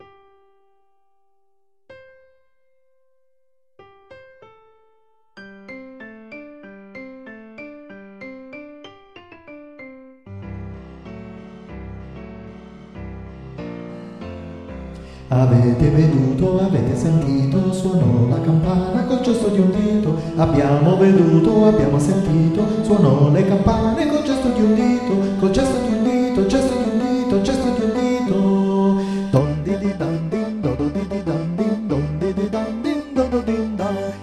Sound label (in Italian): Concerto per prete e campane Tenori